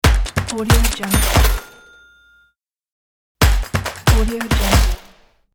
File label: clap stomp cash logo